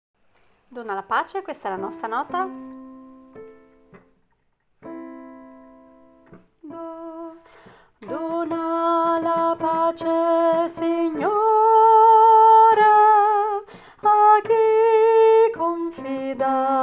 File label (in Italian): dona la pace soprani